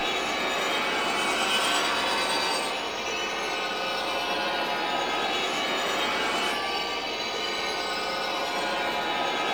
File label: Swarm Scanner Completed Loop; Entrati